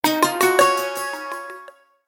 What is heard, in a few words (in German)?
Benachrichtigungstöne Android